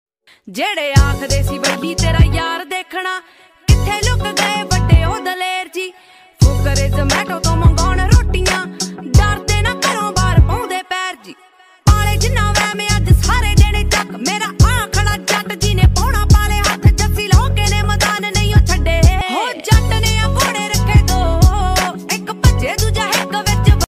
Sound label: Popular Punjabi Ringtone